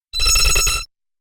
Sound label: glitched tones Feedback FX